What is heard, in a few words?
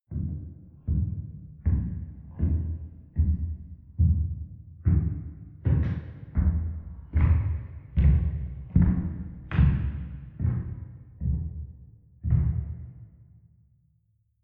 step church wooden stairs medium-fast